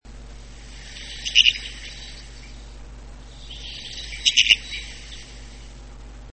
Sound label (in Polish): krzyżodziób modrzewiowy - głos ostrzegawczy